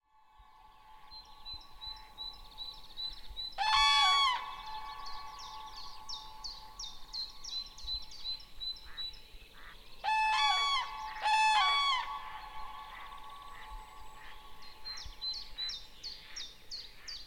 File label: Grus grus; Hajnowek